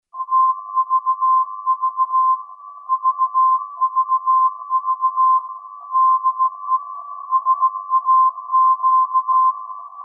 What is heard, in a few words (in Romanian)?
receptie filter mono pitchdown; vlf